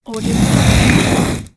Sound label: dragging basket slide